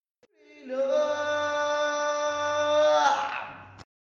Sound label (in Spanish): coro voz